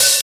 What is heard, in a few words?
Studio Pedal Hi-Hat Sample F# Key, Royality free closed high hat sample tuned to the F# note; hat samples